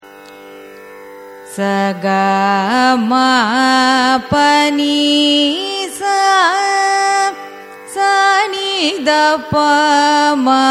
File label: raga dhanyasi arohanam avarohanam; carnatic music